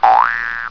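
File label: jaw harp; funny; Wired for Sound Pro CD; Aristosoft, Inc.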